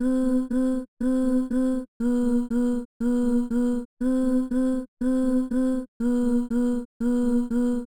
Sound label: woman uh uh